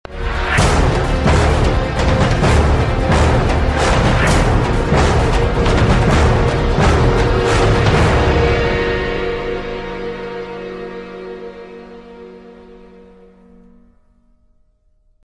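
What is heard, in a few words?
battle sms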